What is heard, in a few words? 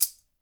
sistrum; Spectrasonics; Liquid Grooves; METALS